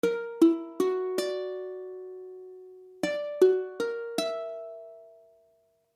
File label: Fis7is5, akordy ukulele; F#7#5; F# akordy (=Gb akordy)